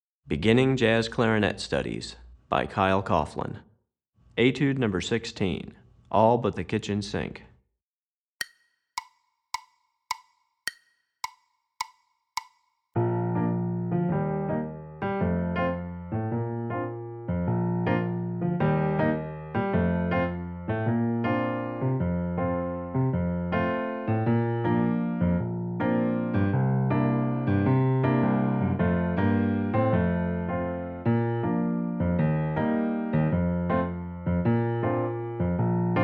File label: Piano; Recordings For Beginning Jazz Clarinet Studies, Volume 2; Piano Accompaniment for Etude #16; Moving Syncopation